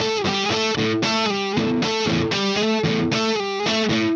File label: Melody Riff Guitar, Indie Rock; Sonic Foundry (Sony Creative Software); Indie Rock Anthems; Session 06 - Haze